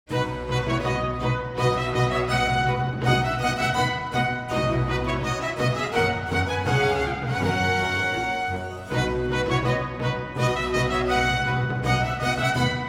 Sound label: Trumpet Tune; Choir, English Baroque Soloists, John Eliot Gardiner; Semi-opera Z. 628, "King Arthur, or The British Worthy"